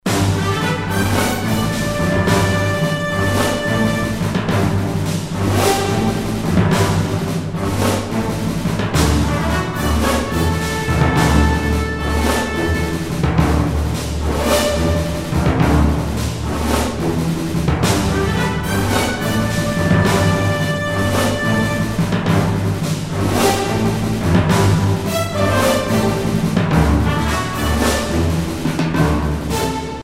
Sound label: Confident; bridgecommander